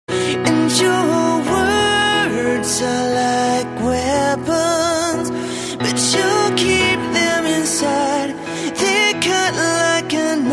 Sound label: the tuning of violins